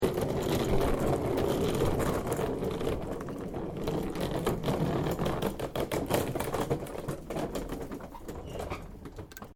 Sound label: Suitcase Casters Maneauvering; Travellers case; Rolling; Objects